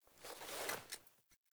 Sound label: Foley Action Sword Equip, Default Clothing